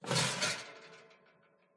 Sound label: glitched tones household kitchen cooker grill pull out clatter